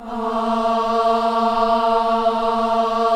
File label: KIDS CHOIR, Gospel